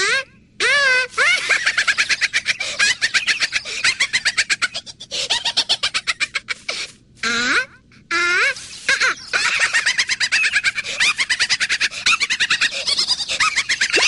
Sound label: zoo zoos laugh